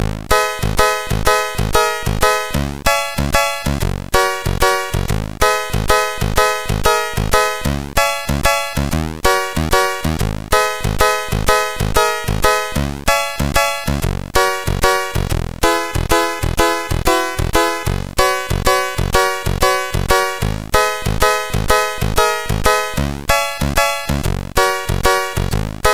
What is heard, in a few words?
Piano Rap, MOS6581R4